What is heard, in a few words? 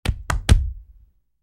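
gorilla voice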